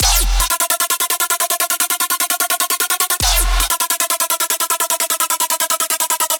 Hybrid Trap Drop Loop - 150 BPM F Min; Synths; Loops; Cymatics; EDM Starter Pack

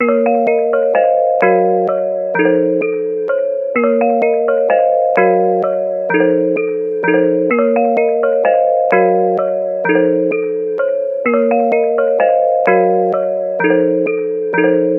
Melody Loop 128 BPM A# Min; Melodics; Melody Loops; Cymatics; Cobra Hip Hop Sample Pack

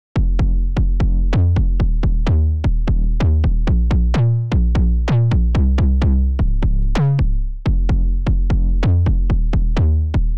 128 BPM F Min Sub; House; Drop Loops; Loop Stems; Cymatics; Sydney

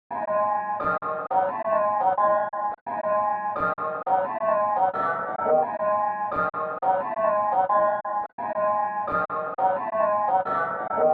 87 BPM C# Min Reed, melody loop stem; Trap; Loop Stems; Cymatics; Circus; 2022 Melody Collection

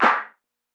Clap, Hip-Hop; One Shots; Ghosthack; Drum Mega Bundle Demo; Hip-Hop & Lofi Drums

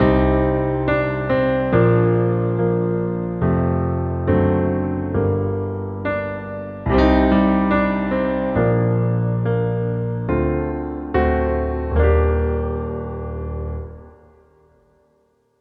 140 BPM Main Piano Gm; Leads; Ghosthack; Baden

